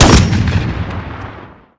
awp

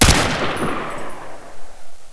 aug, weapons

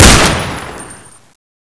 fiveseven, weapons

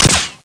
usp, weapons

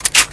p90 clip out, weapons

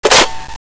MK14 clip in

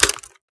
mag locked, m82a1; codmod

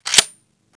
g3sg1 bolt back

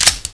slide release, weapons; glock

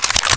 mp5k bolt back

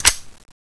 slides, weapons

